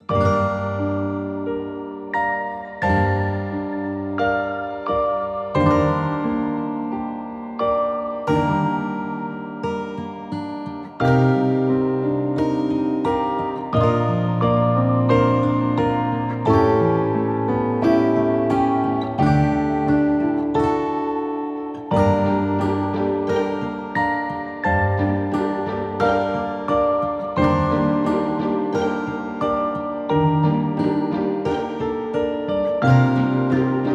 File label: Outside World - Desolate Wasteland; Shuten Order